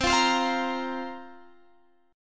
C 7th Suspended 4th, guitar; C7sus4 strummed

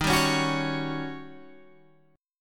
D sharp 7th Sharp 9th Guitar Open G

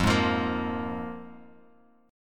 F Minor Double Flat 5th Guitar Open G; down; chord shape

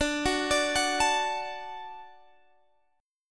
D-Major, D, Guitar, Standard; D arpeggiated; JGuitar

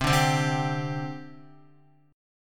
C Suspended 2nd, C, down, Guitar; Csus2 strummed

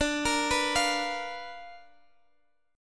D Minor 6th Sharp 5th, guitar chord

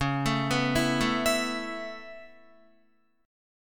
Db Minor 7th Sharp 5th, Guitar, Dbm7#5 chord; arpeggiated; JGuitar

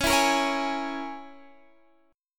Db Minor 6th Db down Guitar Standard; Dbm6 strummed; JGuitar

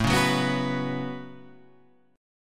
A 6th Add 9th, Guitar, Drop D

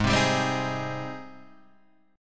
Ab Major 7th Ab down; AbM7 strummed; JGuitar

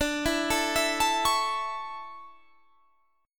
D Major 7th Suspended 2nd; DM7sus2 arpeggiated

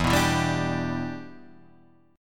E Minor 7th

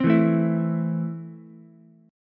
E Suspended 4th Sharp 5th E down Ukulele; Esus4#5 strummed

